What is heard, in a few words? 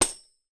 HEADED WO, POP ROCK SET